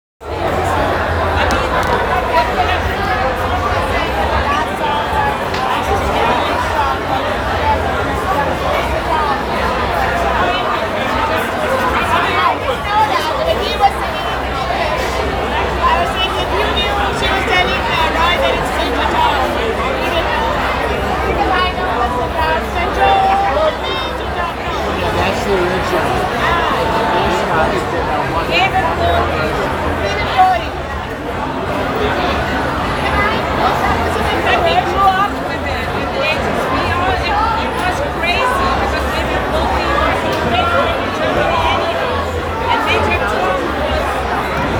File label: oktoberfest hall; Field Recordings